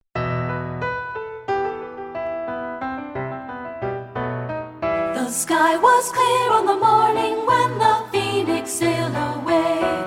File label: soprano